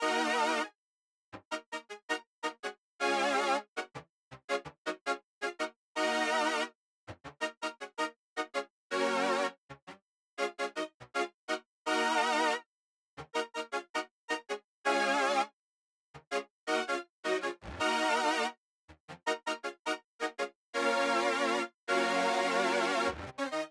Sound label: synth, Smooth RnB, Ebmin; Big Fish Audio; Legendary; Happiness